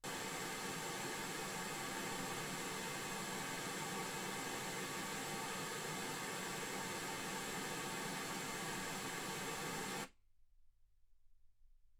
wgn

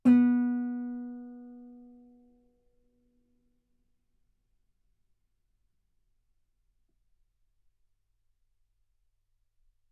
Guitar B3; ff; sul B

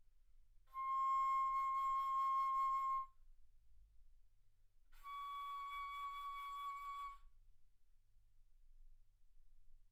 Bass Flute C6 Db6; ff

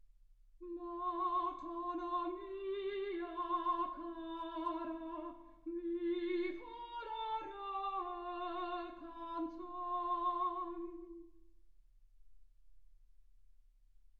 soprano

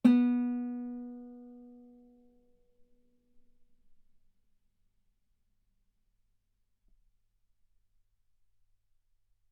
Guitar B3; ff; sul B

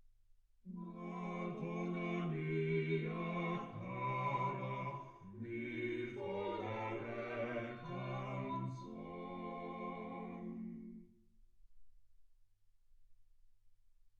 quartet

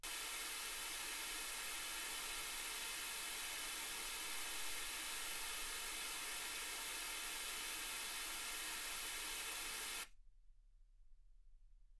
wgn

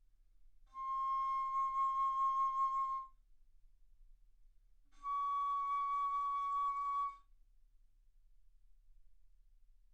Bass Flute ff C6 Db6

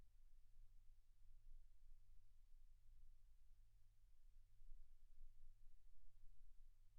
silence 48kHz

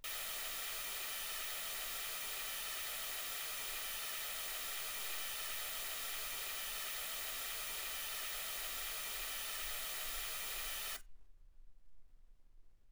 mls16 48kHz

